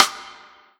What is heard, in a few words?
Yamaha TG100 Side Stick